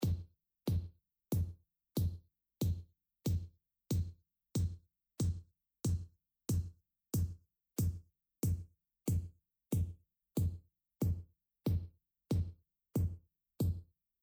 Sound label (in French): Kick